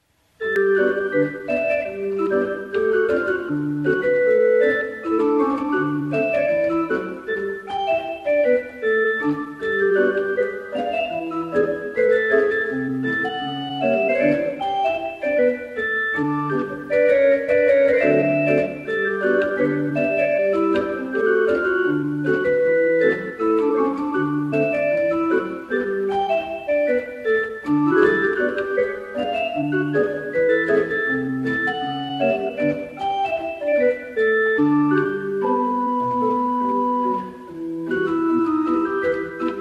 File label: Jazz Pop